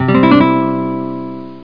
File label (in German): fehler; Universe 5.1